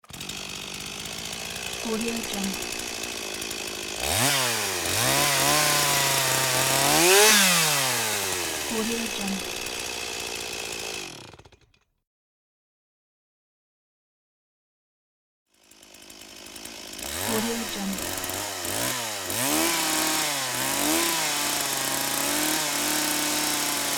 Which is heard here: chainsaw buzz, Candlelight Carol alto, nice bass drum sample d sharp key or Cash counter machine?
chainsaw buzz